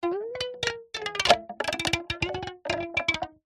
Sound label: elastic band stretched over plastic slide stretch; foley